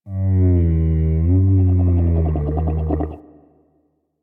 Landscape Mushroom Creak, Ambience; Warframe; Venus Open World; One Offs

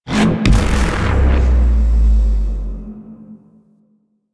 Ship EMP Stun Explo A; Weapons; Warframe; Railjack

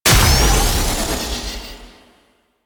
Bite Augment Burst; Lavos Ophidian Bite; Ability Augment Mods

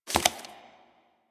Investigate Putdown Card; Warframe; Cephalon Weave

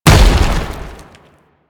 Tank Infested Step Default; Enemies; Movement; Warframe; Lasrian

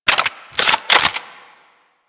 Rifle Reload, Weapons; Drake

